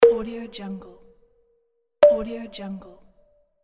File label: cyber pops; astralaudio